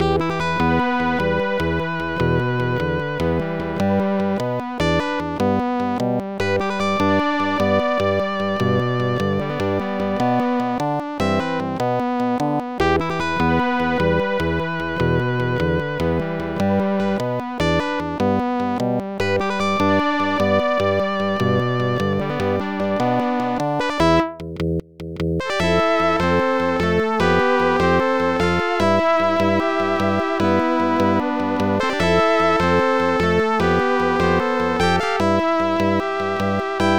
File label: Battle; Liberty or Death; Genesis